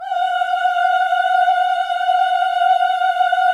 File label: VIB F F#5B-R, Symphony of Voices; Spectrasonics